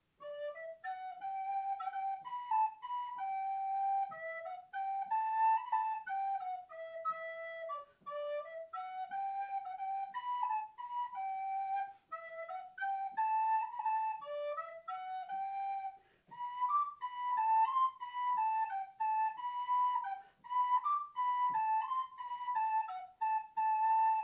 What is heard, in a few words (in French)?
bourree arleuf, flute